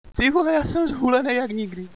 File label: cough, hostage; cstrike